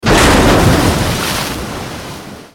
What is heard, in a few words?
Enemy Battleship Hit react; Godzilla Smash3